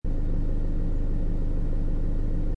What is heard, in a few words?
motor loop